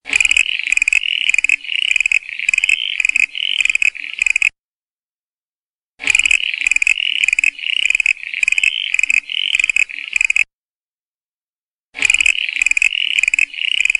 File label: Common Froglet; landcare